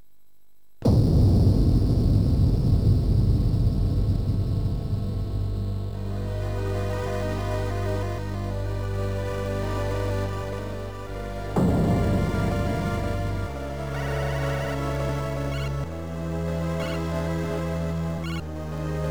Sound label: intro, a500 no filter, amiga; hardwired